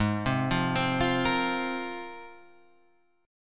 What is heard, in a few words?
G sharp 6th Add 9th G sharp Guitar Standard; arpeggiated; JGuitar